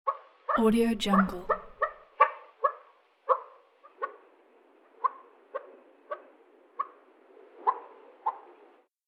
quail

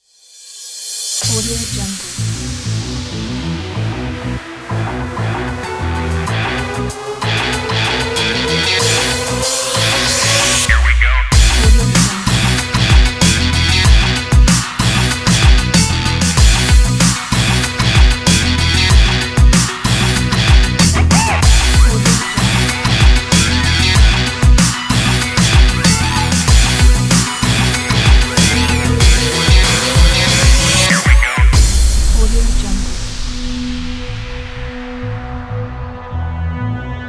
racing

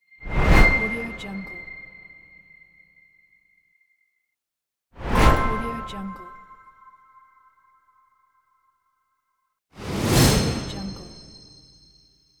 shining whoosh

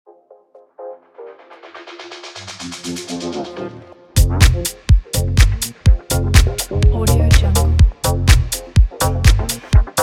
tech house

